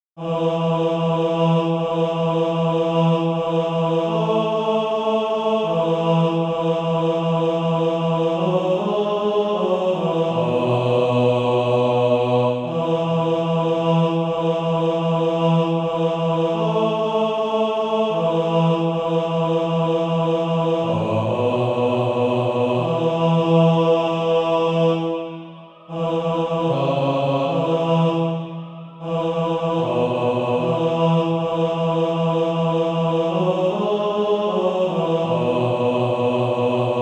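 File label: bass; Facing the Throne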